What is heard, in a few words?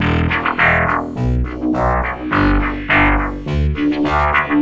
EBGD progression; SYNTH AND ELECTRONIC LOOPS